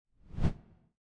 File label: Slide Swoosh